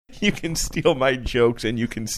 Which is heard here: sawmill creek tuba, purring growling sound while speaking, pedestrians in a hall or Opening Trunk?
purring growling sound while speaking